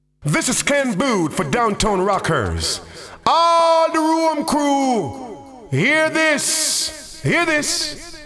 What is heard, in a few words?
jingle